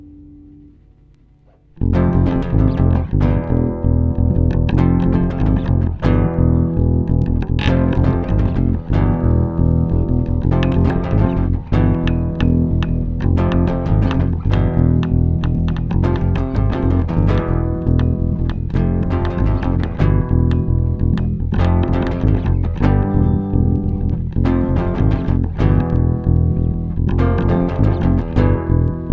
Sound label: Game Music